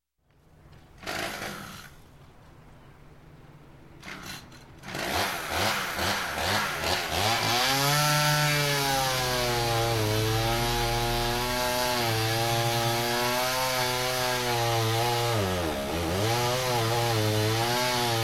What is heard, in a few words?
Chainsaw Start, Cut, Stop, Logging; Sound Ideas 2000 Series